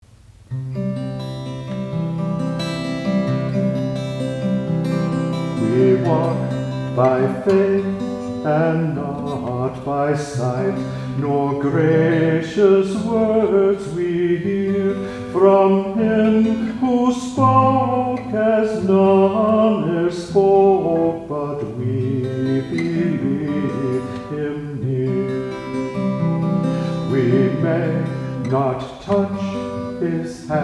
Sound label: postlude; We Walk By Faith